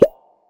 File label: playful bubble pop